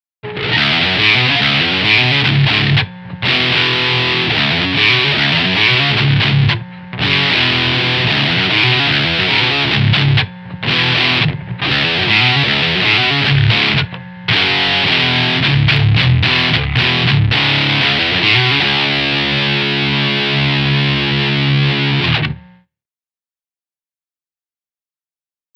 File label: demonizer heavy; Damage Control